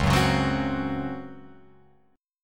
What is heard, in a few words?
Db Major 7th Suspended 4th Db, Guitar Open F, chord; strummed; JGuitar